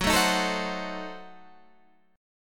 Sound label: F sharp Diminished 7th F sharp Guitar Standard; strummed; JGuitar